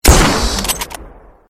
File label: weapon; Jagdfaust